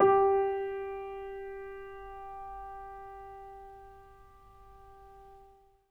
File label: acoustic piano, stereo; InVision Interactive; Miroslav Vitous